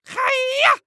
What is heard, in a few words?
Human Male Karate Yell Hi-Yah